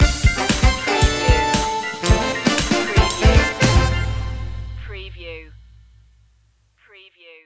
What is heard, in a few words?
the funky two stinger